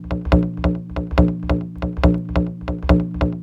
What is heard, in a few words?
NRG Percussion, Trance; Sonic Foundry (Sony Creative Software); Trance NRG VOL-1